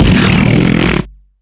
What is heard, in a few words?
poop laser